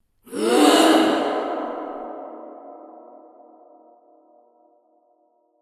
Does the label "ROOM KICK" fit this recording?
No